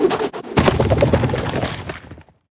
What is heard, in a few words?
tank spin; crocs